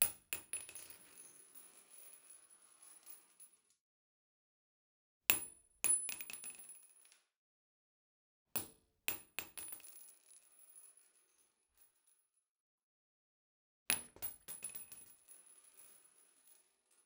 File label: 7 62 x 39 Casings Shell & Cartdridges Impact and Roll on Tile Floor Inside; PPU; Cartridges & Casings Shell; SculpTunes